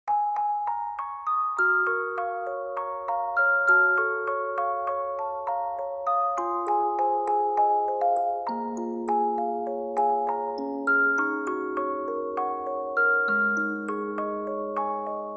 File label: Music Box